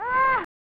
Ahh!; The Pagemaster; SNES

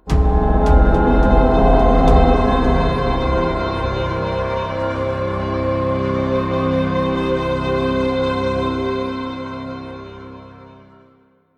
UI Stinger Complete; Starcraft 2 Wings of Liberty

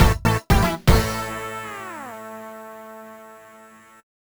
Game Over; Diamond Software Shuffle

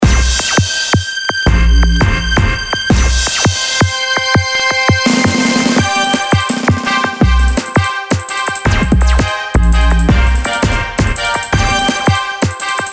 Music; Street Football II; NDS

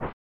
Goalie Throws Ball; FIFA Soccer 96; snes

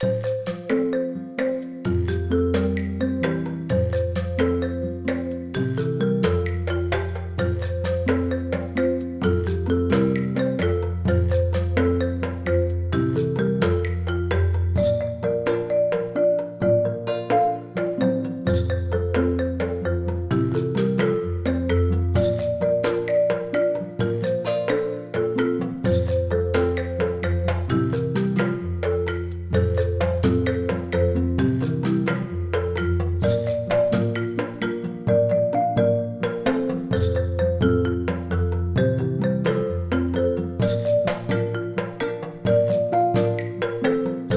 Pacific Islander - Fiji island; anno 1503 gamerip

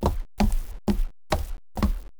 wood walk; blockade classic

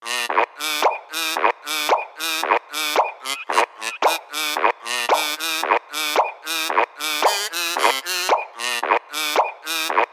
Birds' Song

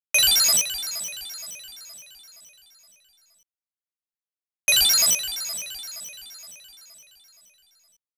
Block Completed

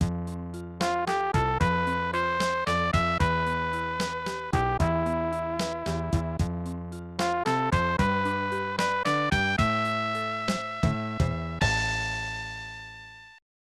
Lose League; J.League Excite Stage '94; SNES

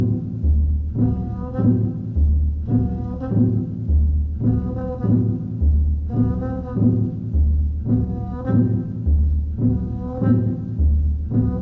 Apartment Jazz

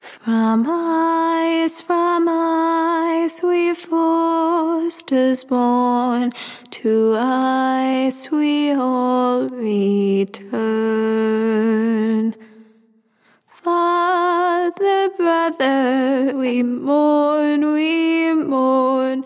Funeral Ballad; Linear Forrester Funeral Ballad Silent

